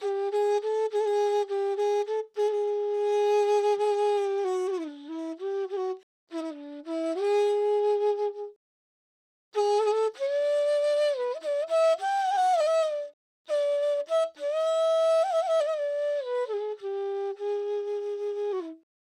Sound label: Dancehall Chinese Flute Emin 100 BPM